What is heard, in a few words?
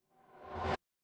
transition riser